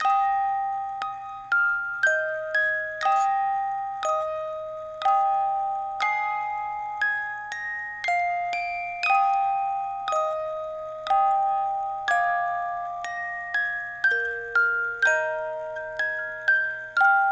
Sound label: Music Box